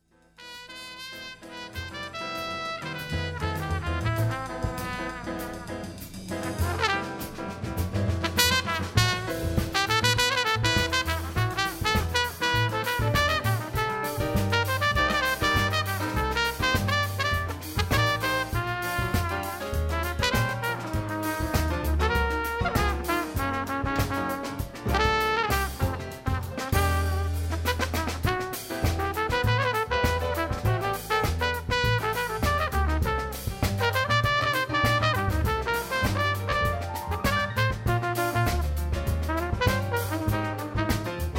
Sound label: Latin Trio